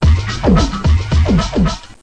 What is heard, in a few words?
110 tribaloid, DRUM LOOPS and BREAKS; 101 to 110 bpm